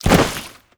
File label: muck land